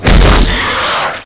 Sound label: warrior hiss